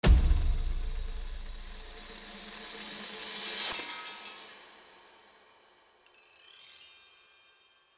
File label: music logo